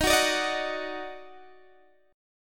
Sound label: Eb Minor Major 7th Eb down Guitar DADGAD, chord